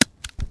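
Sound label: grass; Mafie 1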